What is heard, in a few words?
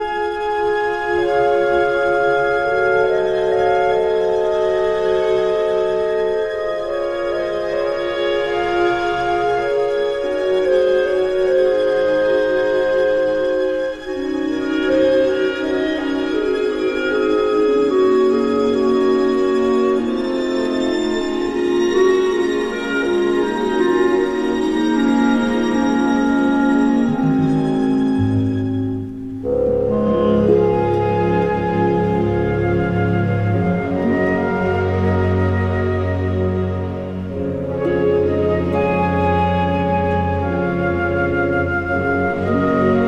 Slovak Radio Symphony Orchestra; Suite Bergamasque; Clair de lune